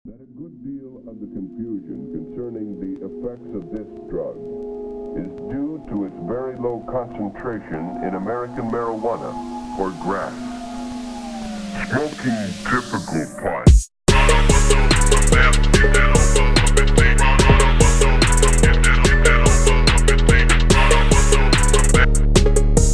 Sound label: Phonk